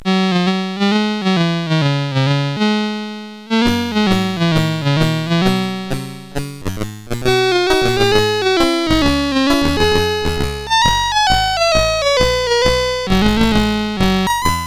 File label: Fuzz, sid CSG8580R5; hvsc